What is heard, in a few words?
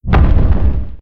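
monster damage; mamuo; Senillinea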